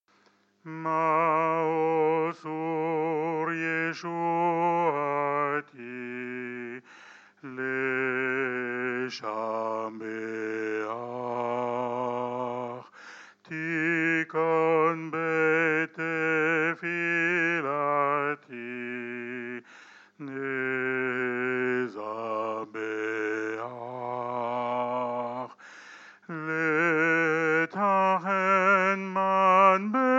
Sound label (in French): chant; Maoz Tsour; 3 chants religieux